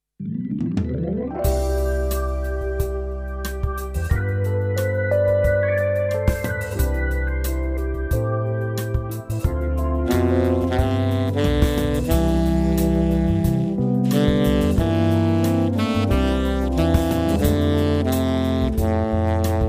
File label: Oh Danny Boy Tenor